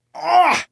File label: Wound; Valanar